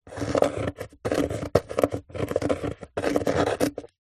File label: office pen biro draw shape on thin wood